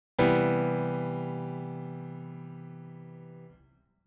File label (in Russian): bolshoj mazhornyj septakkord